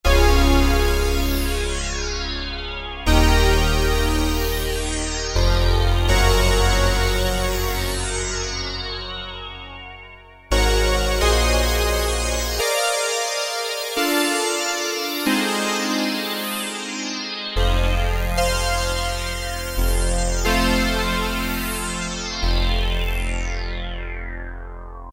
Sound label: Sweep II, Roland Juno-106; Factory Preset